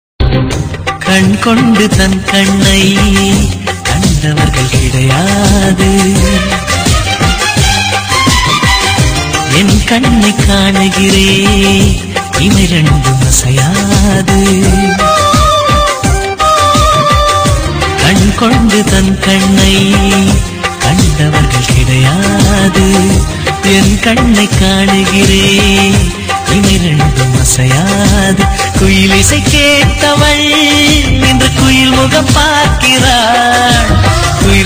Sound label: love bgm ringtone romantic; vijay